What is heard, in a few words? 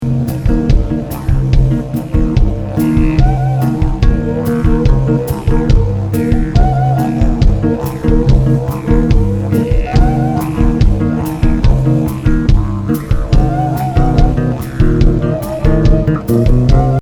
Bossanova